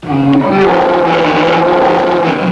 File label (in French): monstre; csde rambo halloween